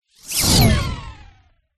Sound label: science fiction robot burst